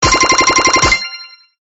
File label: coins entry; dino